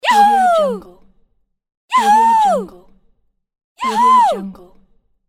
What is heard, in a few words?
woman yahoo